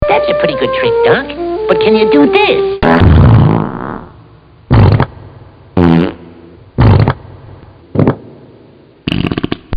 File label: Funny sounds - Fart; Bugs Bunny